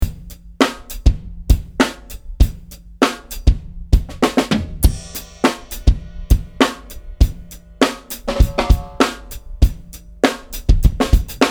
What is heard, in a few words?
drumset